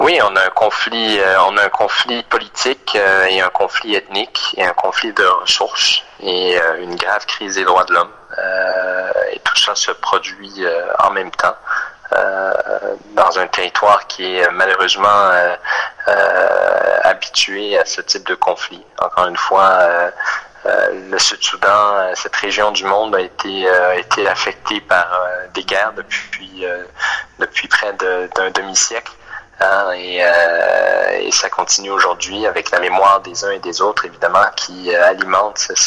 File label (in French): Sonore Ethnique